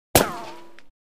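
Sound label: bullet shot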